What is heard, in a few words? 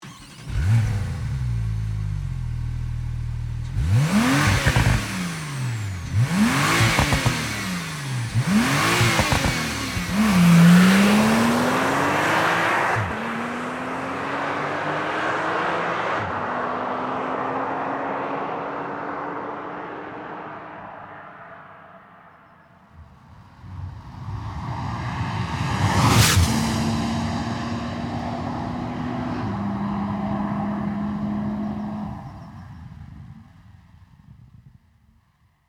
porsche 911 Carrera Standard Exhaust System